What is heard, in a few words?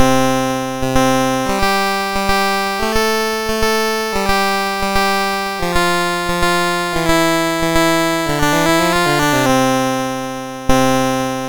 MOS6581R4, GAMES